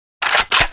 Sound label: weapon ready, assault rifle